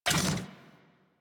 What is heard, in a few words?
Mech Step Arm Up; Nechro